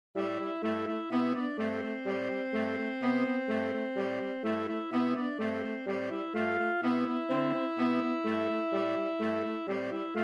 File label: Balalaika Suite for Saxophones; Stravinsky